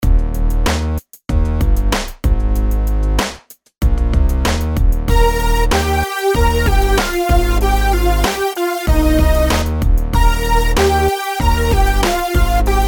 melodies simple Ebmaj; melody

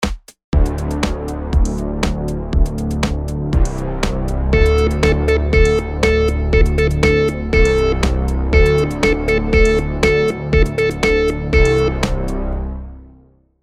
rhythm simple, monotone

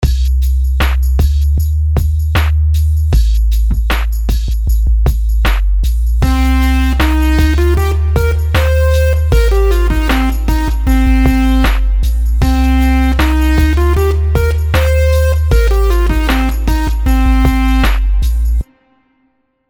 melodies simple 16s Cmin; melody

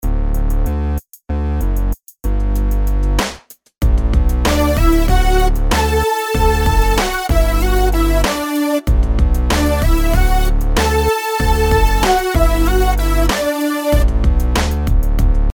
melodies simple Dmaj; melody

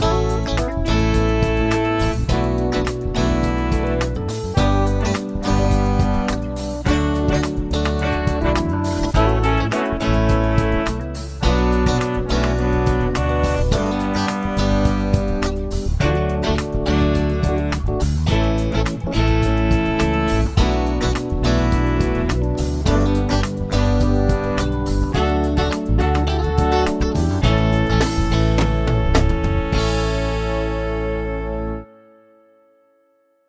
chord prog with mixture

